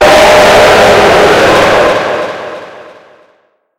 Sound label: jumpscare